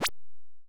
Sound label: Game Shot Light Gun